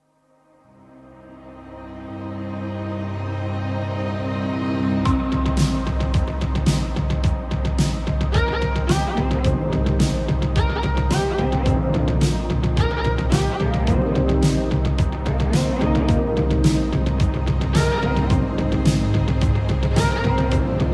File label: Epic Orchestral Medley; Stranger Things S4